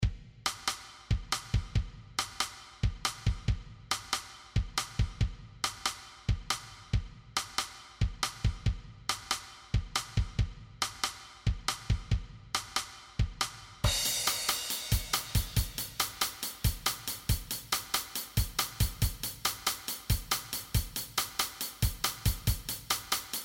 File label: Drums